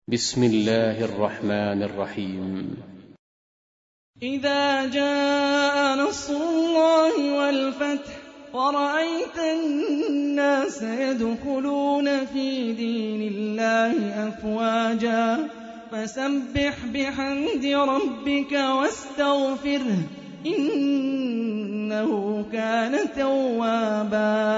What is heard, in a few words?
Quran; Hani Refaey